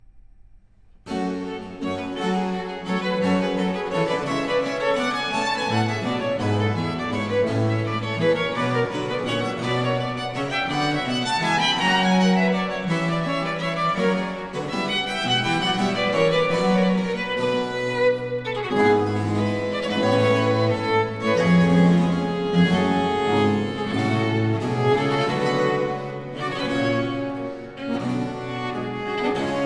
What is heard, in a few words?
Trio Sonata in G Minor Allegro Vivace Adagio